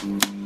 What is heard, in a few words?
start vacuum; qs-housing; WestsideRP